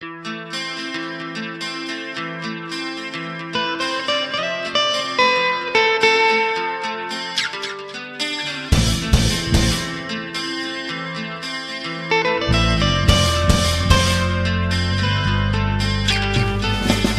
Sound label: soulful guitar